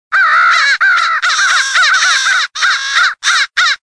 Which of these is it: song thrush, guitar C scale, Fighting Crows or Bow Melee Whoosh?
Fighting Crows